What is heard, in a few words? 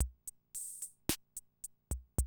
CR78, DrumLoops